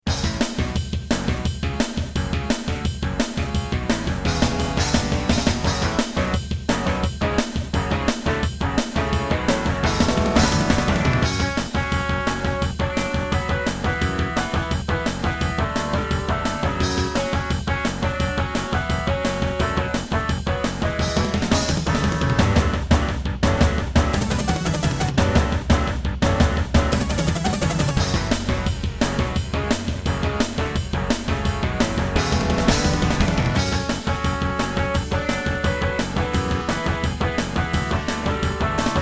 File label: Intro, Pine PT-202 Vivaldi Wavetable DB; Duke Nukem 3D